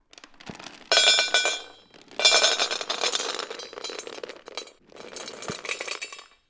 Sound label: Dog Pellets